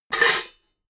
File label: pots clank hard